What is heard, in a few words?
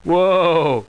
woah; Spaceward Ho 4.0